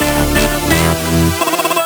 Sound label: Fill Ins 128BPM; Vengeance Samples